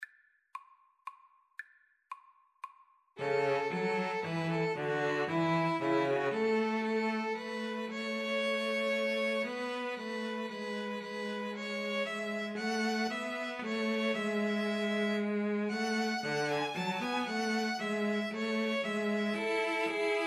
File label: string trio